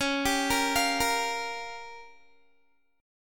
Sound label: Db 6th Flat 5th Db down Guitar; Db6b5; arpeggiated